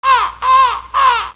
TREE CAWS

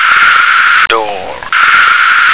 door

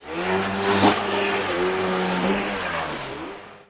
BOAT

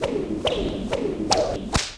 SLING

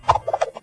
dart; 5element

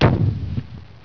FIRE

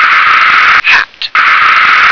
hat

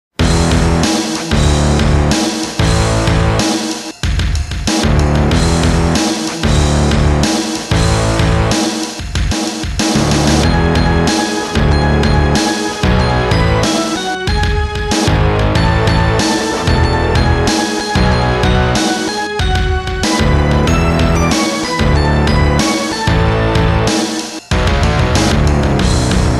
Xmas, shareware fanfare; Jazz Jackrabbit; Holiday Hare

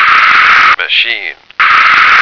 machine

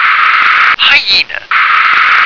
hyena

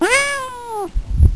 cat

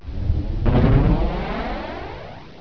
STRIKE

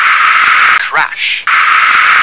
crash

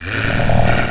IMP; DOOM WADS

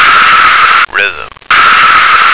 rhythm

